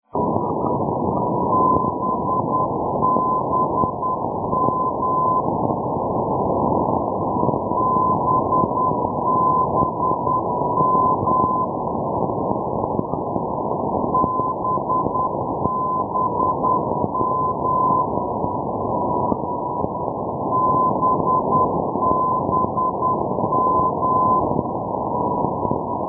ndb zielona gora babimost

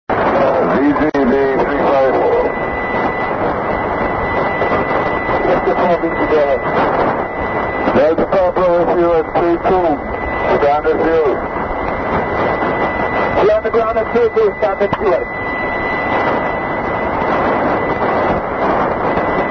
5569 kHz

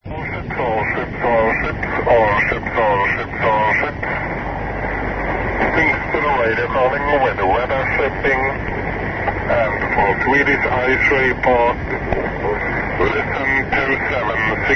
radio 2182 khz; tingstaede; europe

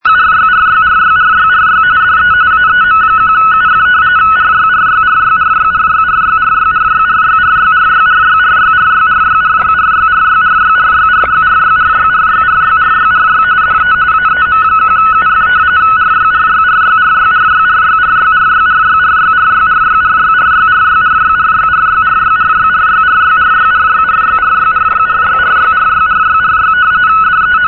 us army mars rtty 45 bd; boeblingen 'aem1uf'; europe

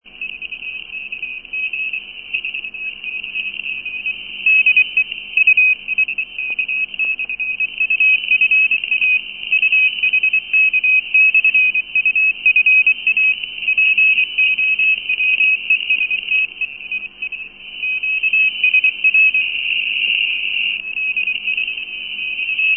batumi radio cw; europe; 'uhk-ufa'; rainer brannolte

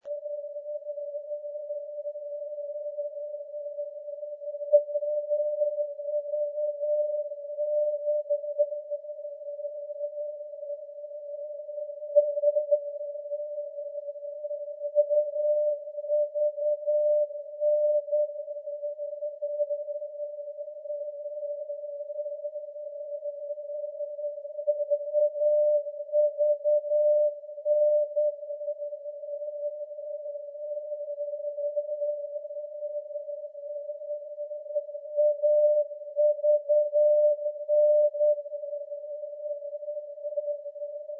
ndb vilnius; mragowo, pol

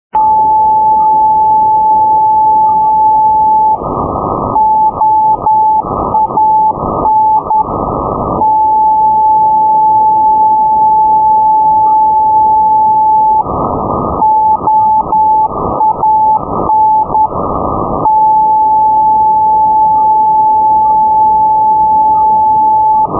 ndb orleans bricy; oan-385; paris; europe

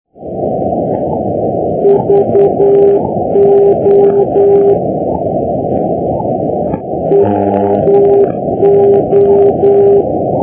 ndb vojens skrydstrup; vo-321; europe

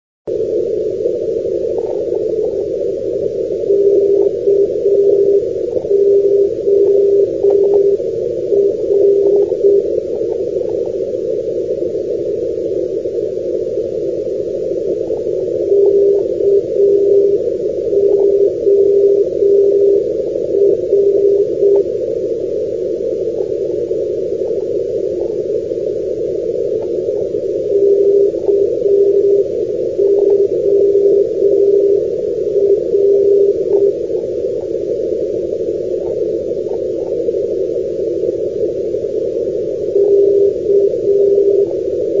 ndb korinthos

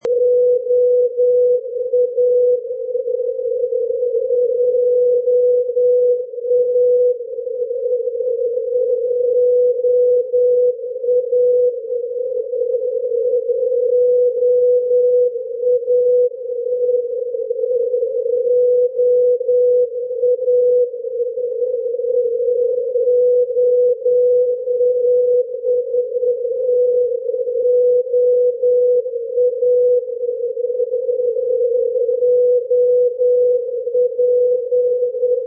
ndb jonkoping; europe; julussdalen-kiwi

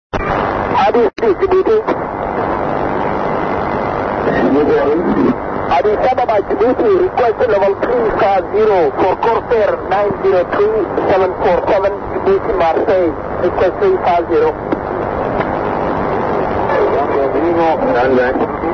djibouti aero; wkg eth-21-addis 11300 khz 2155z

